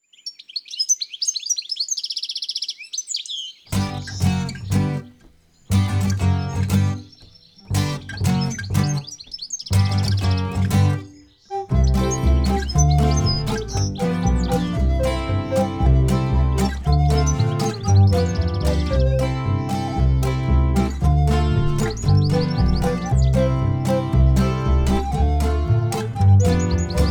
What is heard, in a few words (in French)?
Les trois chardonnerets instru; cuicui et compagnie